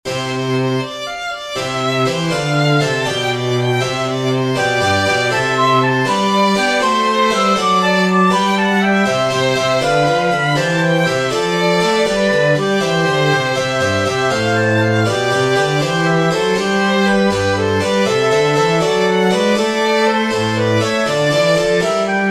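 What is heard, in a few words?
Trio Sonate C major Allegro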